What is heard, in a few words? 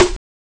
ac sharp drum, Amiga Soundtracker Sample; ST-XX Amiga Soundtracker Sample Packs